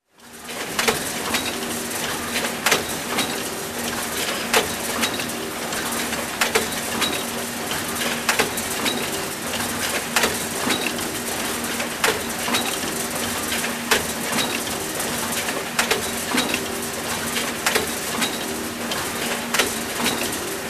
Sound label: Industrial Engine running with metal clanks